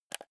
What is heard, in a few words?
console controller joystick press fast